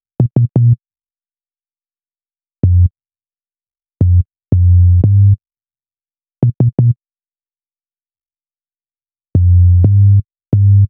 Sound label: Bass, Hip-Hop